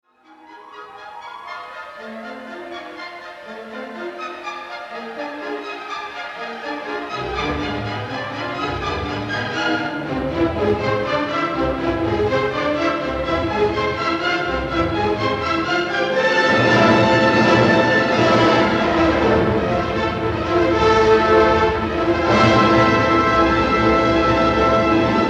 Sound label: Symphony No. 9 in d II. Scherzo. Bewegt lebhaft Trio. Schnell Scherzo; Bruckner